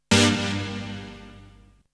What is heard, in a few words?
Synth; ZERO G - Datafile DISC 2